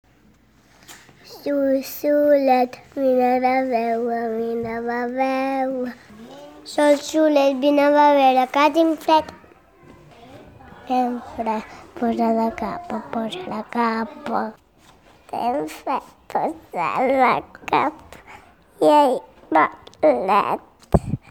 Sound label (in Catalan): Sol solet; P3 treballem els sentits